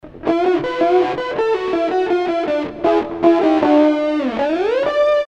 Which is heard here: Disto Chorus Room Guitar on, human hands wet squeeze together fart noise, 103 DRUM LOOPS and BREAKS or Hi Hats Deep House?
Disto Chorus Room Guitar on